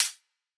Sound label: Classic hiphop hihat